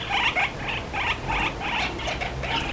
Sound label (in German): aufgeregt, Katharinasittiche